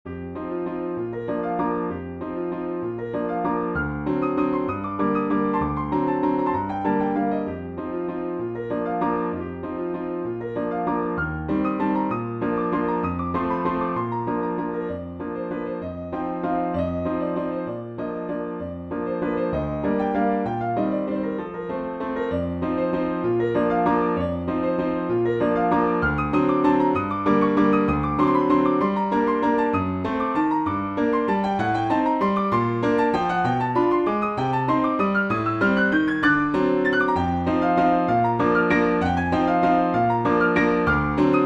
waltz; in the style of chopin

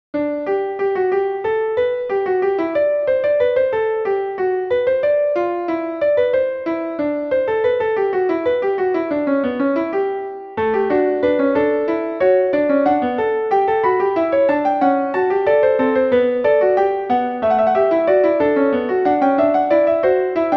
Fugue in G major

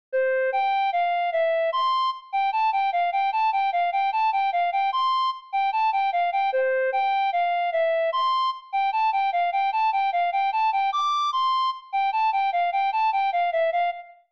Waterlily Sonatina, for Flute

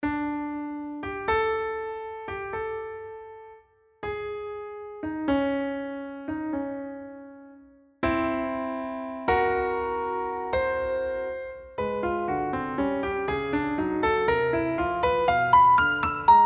little thing for piano

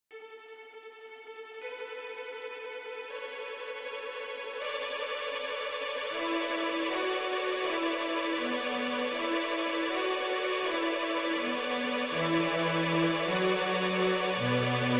Three Violin Version Morning Birds

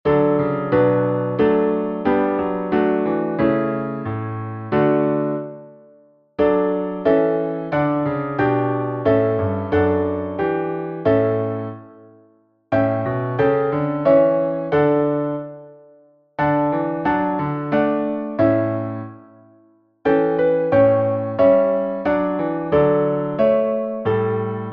Chorale

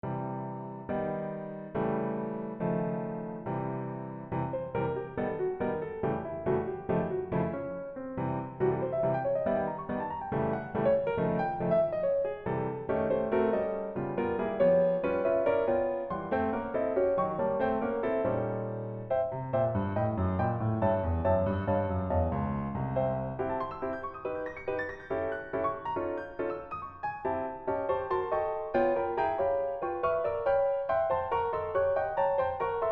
Quick Diminished Changes

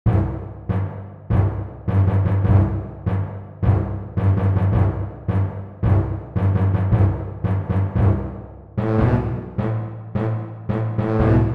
Forward March

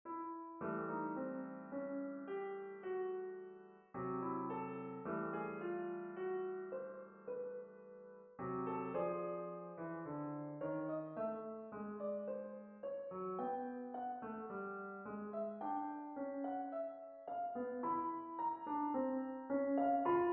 Moderately Expressive

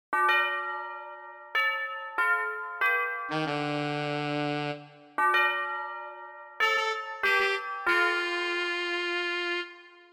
Tubular bells and Tenor sax

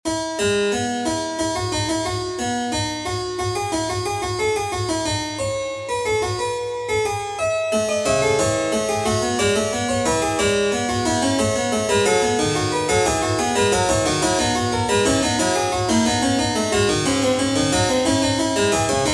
Invention in E major

